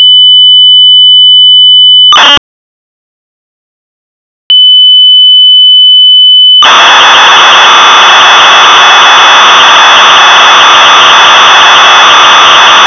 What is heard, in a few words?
3D FUNK